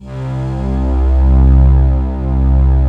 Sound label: FINAL PAD; ENDINGPAD